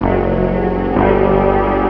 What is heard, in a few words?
HORROR